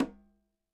CH mt h, KILLER CONGA

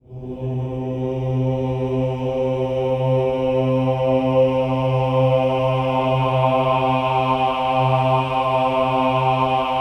OH-AH C3, choir; Spectrasonics; Symphony of Voices

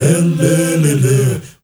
MALE HUM; Spectrasonics; Heart of Africa

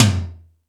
Toms, E-MU Pro-Cussion

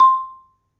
C4, Marimba; Terkelsen

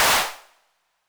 HAND CLAP, 909 Reverb Pitch Width

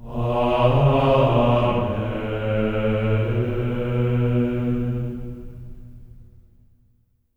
AMEN B3, Symphony of Voices; Spectrasonics; GR AMEN

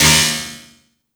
PERC, Drum & Bass, 170 BPM

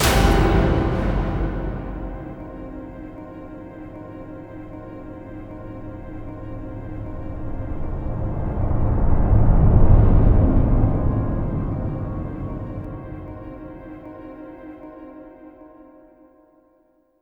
CLUSTER SLAM; Spectrasonic Distorted Reality 1

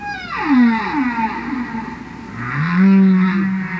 ANIML; Zero G; Man Machine

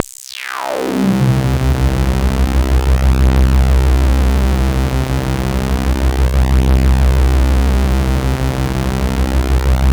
CUTOFF, Analogon; CMP - Analogon

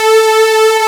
Jupiter Strings A3; Proteus 2000; Digital Sound Factory Proteus 2000

